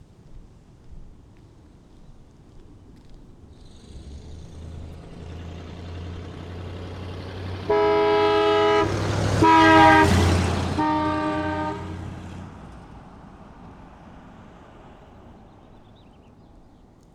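AUTO Exterior Doppler Horns With Engine, 1964 Mercury Montclair; Secret Source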